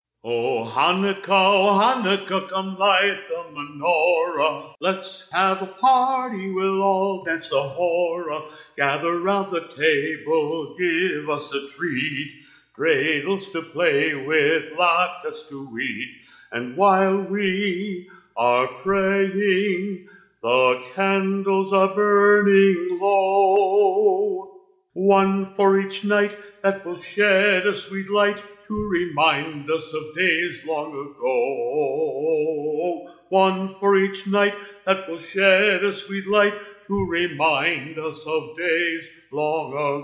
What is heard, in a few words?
O Hanukkah, religious, 60, F sharp, acapella